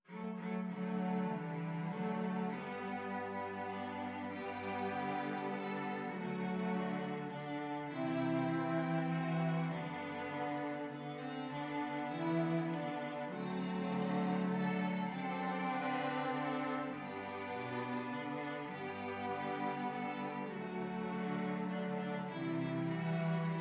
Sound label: cello quartet